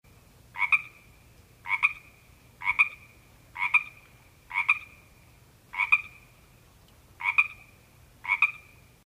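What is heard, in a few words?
sound of frog croak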